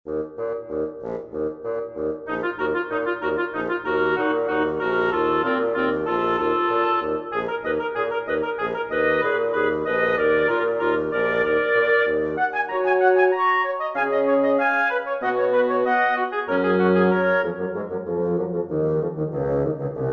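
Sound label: Småtroll, for woodwind quintet; Lyric Pieces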